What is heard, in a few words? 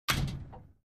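Gym Weight Equipment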